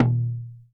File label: Tom Acoustic Round